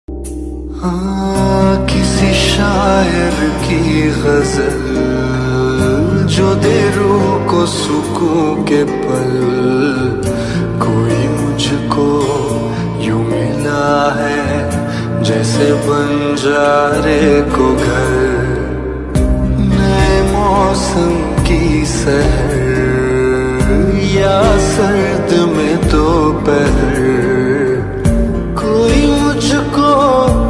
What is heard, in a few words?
lofi ringtone; ek villain